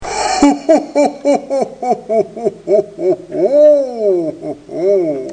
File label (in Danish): sjove grin, julemand